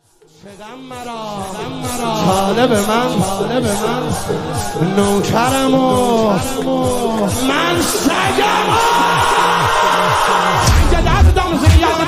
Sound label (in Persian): مداحی